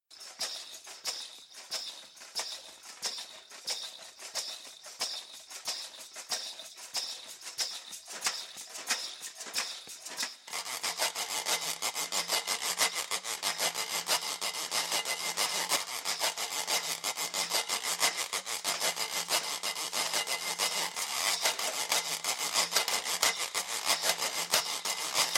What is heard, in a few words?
swampy groove small perc only